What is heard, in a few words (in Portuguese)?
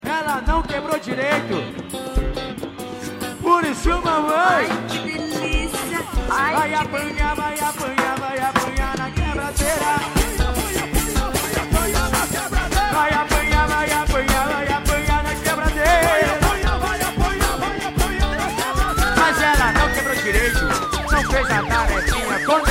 banda swing tecnomix; tecnomix producoes